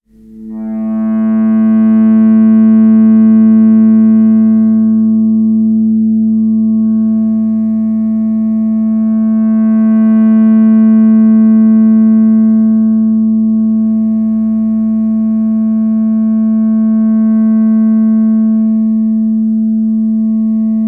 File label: ebow